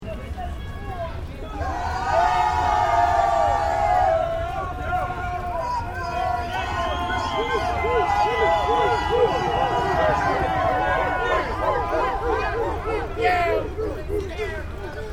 Demonstration Protest New York Short, Crowd in Central Park; shouting, marching and protesting